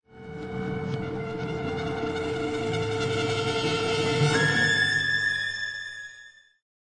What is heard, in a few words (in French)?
cuivre